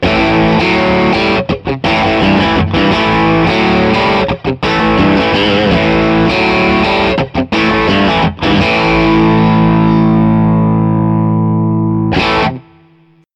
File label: bluguitar amp1